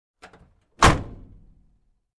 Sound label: Closing door on Truck